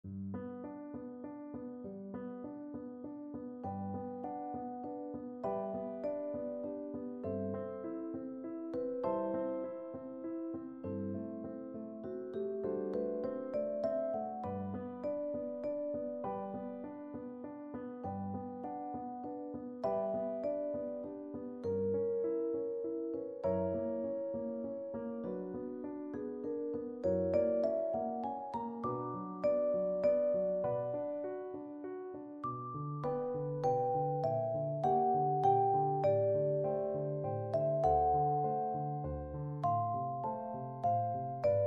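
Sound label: vibraphone; saint saens; swan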